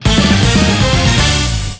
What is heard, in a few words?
Check Jingle; rapala we fish wii gamerip 2009